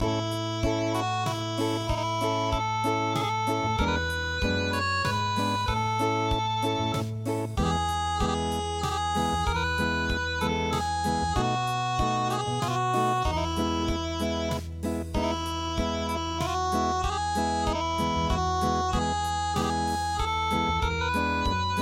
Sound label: Acoustic